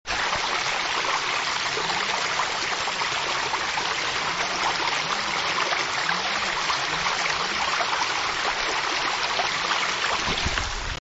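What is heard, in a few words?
babbling brook; seseragi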